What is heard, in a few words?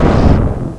grenade exp, weapons; XDM